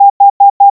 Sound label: oubun h, morse